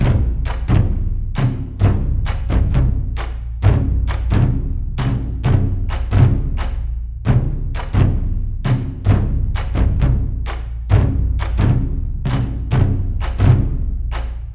Drums; For Honor

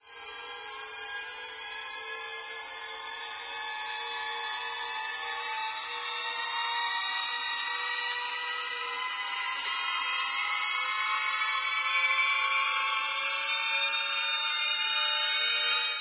Buildup Violins; friday the 13th killer puzzle

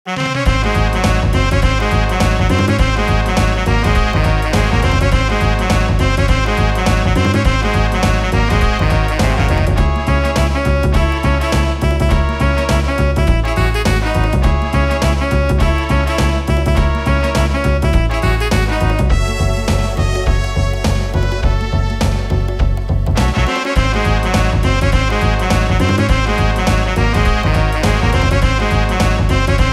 Token Tango intro; donkey kong country 2 fan remastered

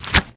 Hit

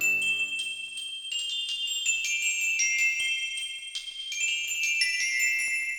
Music DownBeat C Other; Plants vs. Zombies Battle for Neighborville; GnomeGnight

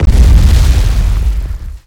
Pillar Explode; Nicktoons Nitro; arcade

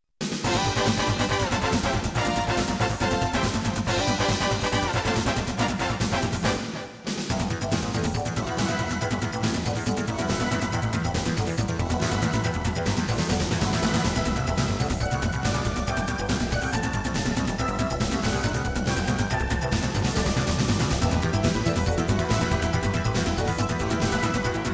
Score Attack; Summer Carnival '93 Nexzr Special; Naxat Soft; PC Engine

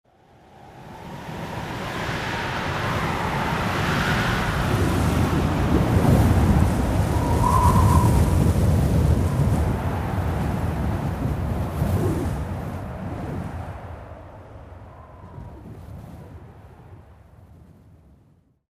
Wind Gust Summit One Shot

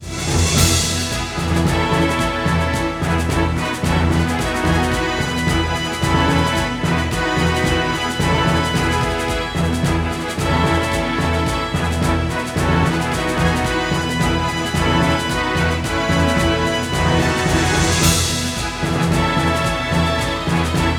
Victory Semifinal Map

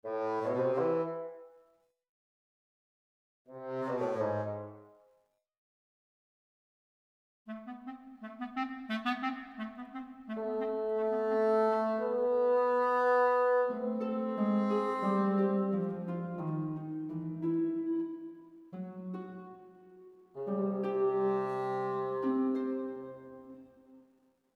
Music Amb Orch; MushroomBoss

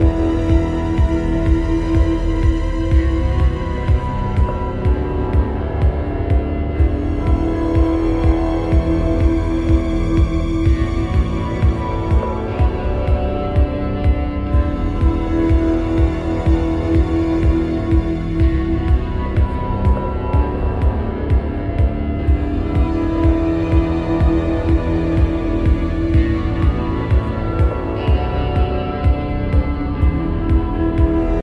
onboarding cave action; Fortnite

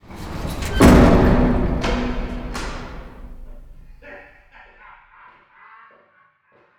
Cell Door Close

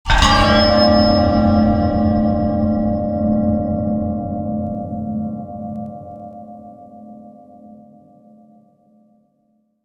Chiller sting

